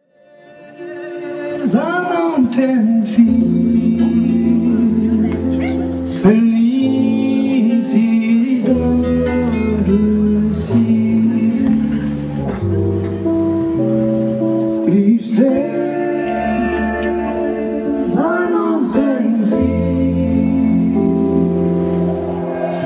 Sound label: chant, bossa